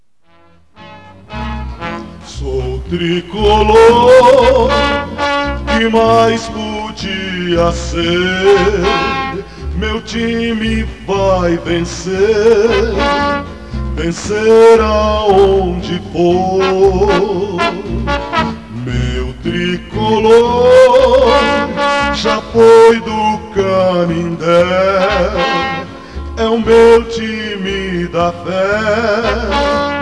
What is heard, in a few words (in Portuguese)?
musica para o tricolor